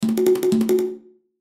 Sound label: Hand Drums Rhythm; Cartoon; Musical